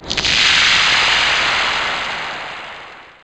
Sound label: SYN-FX